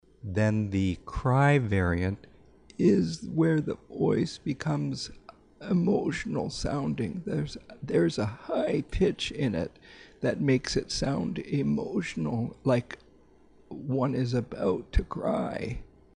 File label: Tonic Cry variant